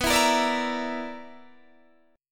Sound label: B-Minor Major 7th Double Flat 5th, Guitar DADGAD